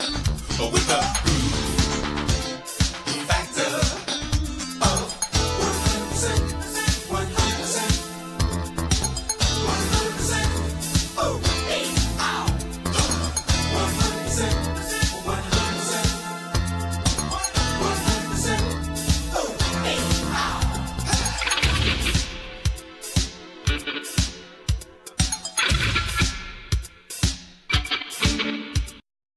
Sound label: groove